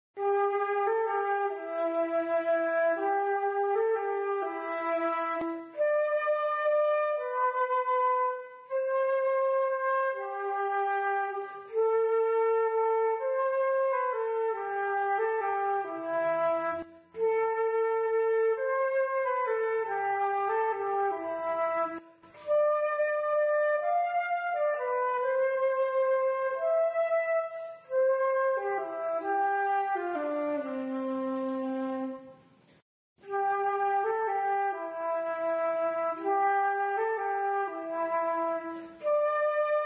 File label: Flute Silent Night; Instruments; Zomboid; Lifestyle